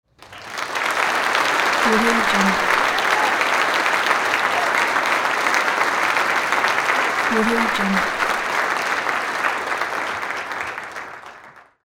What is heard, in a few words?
applause